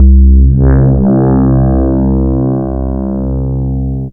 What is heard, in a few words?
Single Electric Basses, Dance Floor Bass